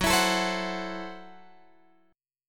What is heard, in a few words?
F sharp Minor 7th Flat 5th, Guitar Open F; F#m7b5 strummed; x,13,16,16,15,13; chord shape